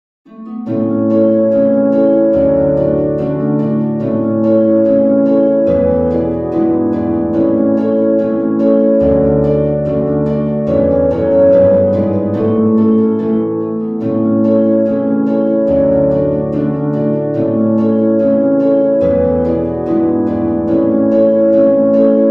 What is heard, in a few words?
Boil Em Cabbage Ensemble, Harp 3 & 4, traditional folk tune; Arranged for Intermediate PEDAL HARP